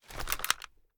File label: reload raise; reloads; gmod; iw8